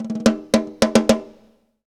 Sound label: Fills, Roland; Sound & Vision - Gigapack I; Perc